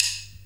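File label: snare drum rim shot; 90 s Sample CDs; Best Service Dance Mega Drums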